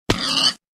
pig died; minecraft

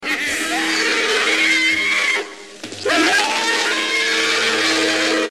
roar

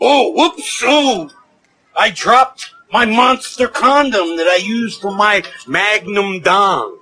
oh whoops oooh

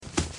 footstep; fnaf

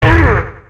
whomp sound effect; super mario 64

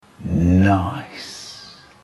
nice slow mo

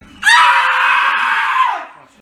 reee

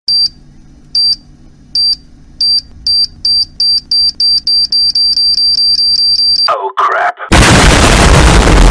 bomb defuse

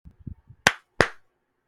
double clap

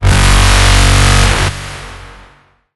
horn; reaper

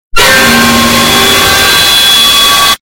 metal pipe; but its a war crime

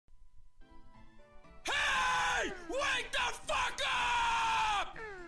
alarm; novas wake the fuck up alarm

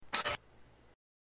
traffic lights

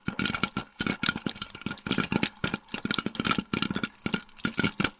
popcorn popping

